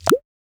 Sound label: Grab